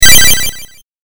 8bit 回復

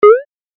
短い音 プイ

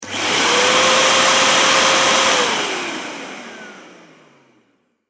掃除機 短

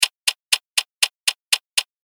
制限時間

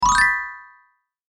チェレスタグリッサンド

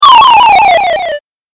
8bit 下降

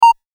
文字送り 単; ボタン・システム

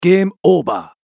ゲームオーバー

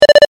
文字送り ループ用

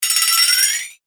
キュピーン

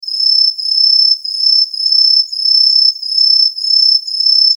アオマツムシ 短め

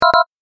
エラー

